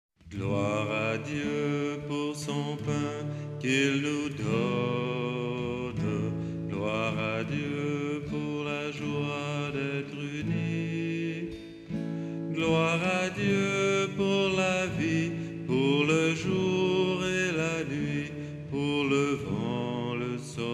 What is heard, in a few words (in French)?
gloire à dieu pour son pain